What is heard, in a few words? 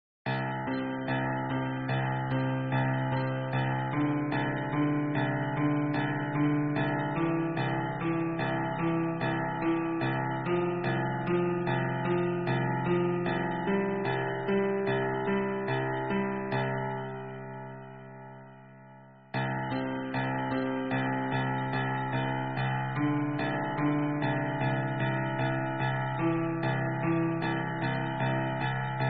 piano beats; how to use piano to make beats